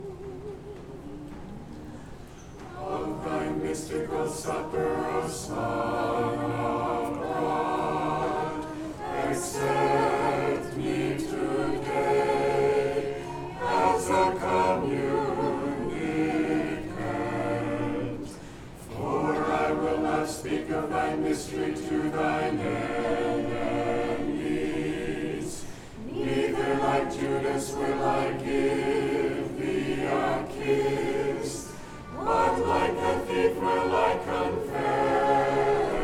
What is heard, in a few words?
vocals choir; mystical supper